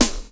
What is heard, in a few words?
DR. LOOP; X-Static Goldmine 3